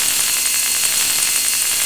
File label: weap scope zoom; weapons; Call of Duty Modern Warfare